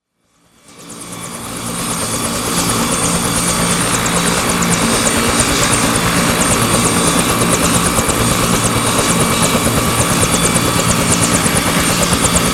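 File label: helicopter; zvuk vertoleta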